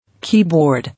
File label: Keyboard